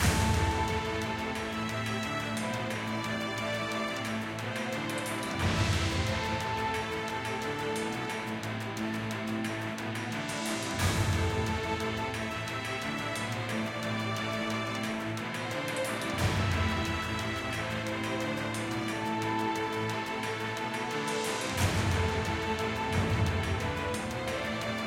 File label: Destruction Med; Great Wall